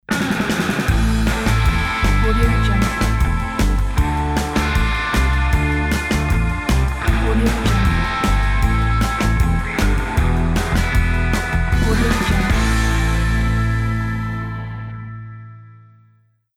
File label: surfing waves logo; mcorvatta